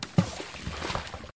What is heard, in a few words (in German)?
Stein fällt ins Wasser